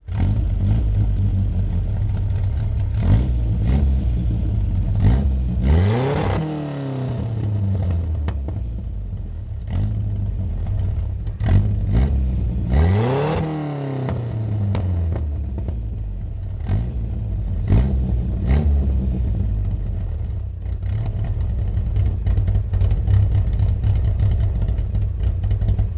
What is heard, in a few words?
27 T Ford Roadster; 30s